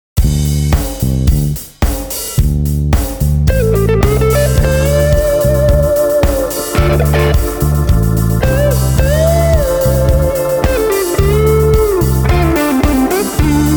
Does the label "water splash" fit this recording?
No